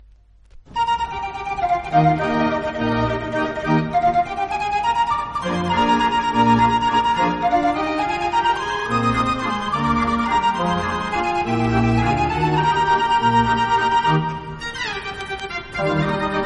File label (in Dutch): draaiorgel; grote dorus wolvega